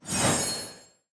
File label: elf staff